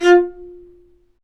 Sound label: Low Marcato; Roland L-CD702; Combos 1